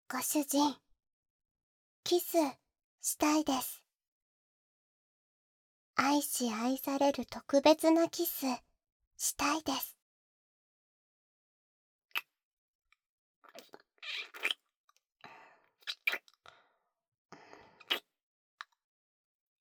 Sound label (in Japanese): 純粋なキス